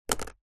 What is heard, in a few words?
foley cigarette place down on surface